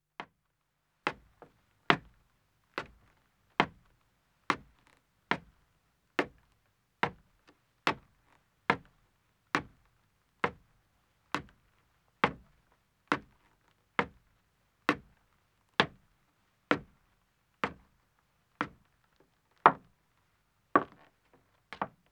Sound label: Wooden Stairs Ascending, 1 Man, Footsteps; BBC Sound Effects Library; Footsteps II